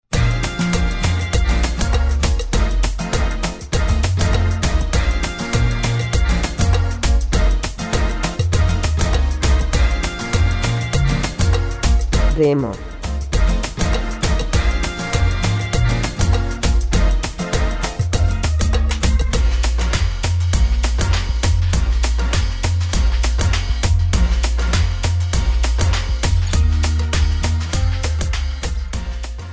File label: Salsa